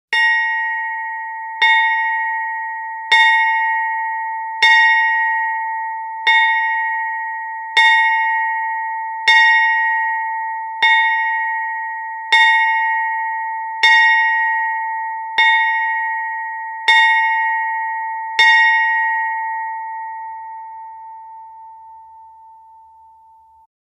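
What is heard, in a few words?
Church Bell Very Small, bell towers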